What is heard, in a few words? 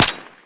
AR loop, 9x39mm; Caliber